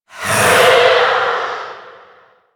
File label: Rell Specter Vocal Attack; Enemies; Warframe; Priest Quest